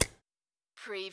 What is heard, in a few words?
Pneumatic Drill Plugged In